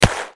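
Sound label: submachine gun shot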